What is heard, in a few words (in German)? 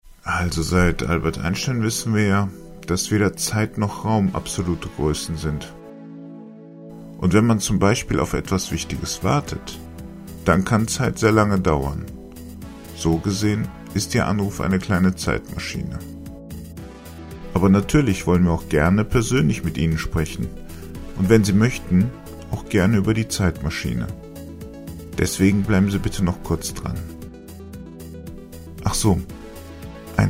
warteschleife telefon